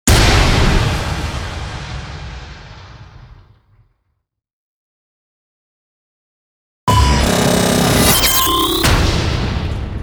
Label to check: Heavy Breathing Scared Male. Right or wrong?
Wrong